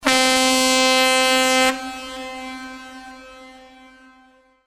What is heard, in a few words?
Ship Horn